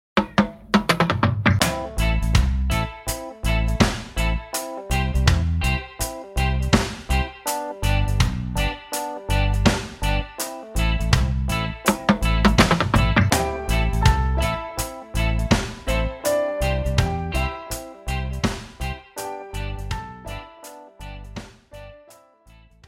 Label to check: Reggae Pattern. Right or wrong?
Right